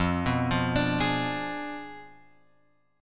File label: F Diminished 7th F; Fdim7 Chord {1 2 3 X 3 4} arpeggiated